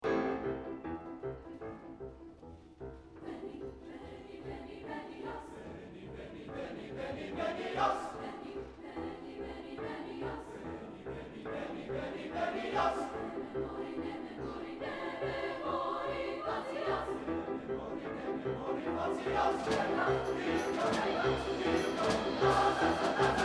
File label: Veni veni venias, Choir; Carmina Burana